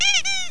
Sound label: squeeze toy